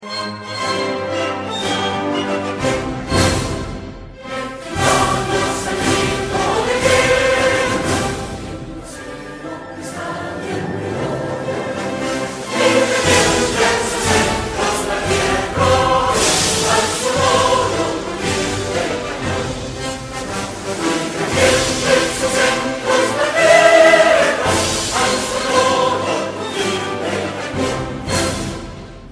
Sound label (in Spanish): himno nacional mexicano